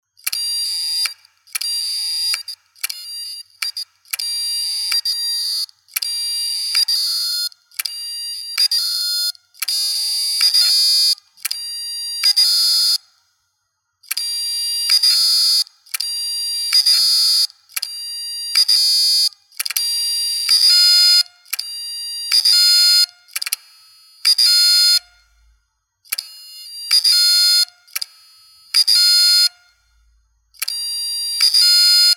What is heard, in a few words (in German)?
Toshiba Canvio Basic USB3 Externe Festplatte Geraeusche nach Headcrash MQ01UBD100